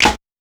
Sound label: clap rim